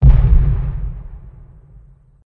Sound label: mortar; czero; de kabul cz